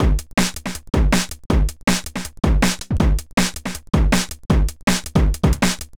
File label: DRUMLOOP D+B 160, Drum Bass Loop; eJay